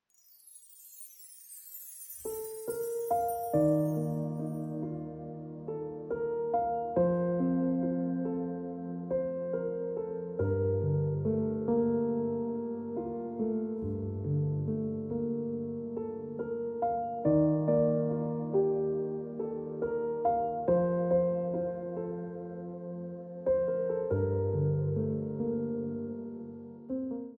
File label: Emotional Life Ringtone Piano Music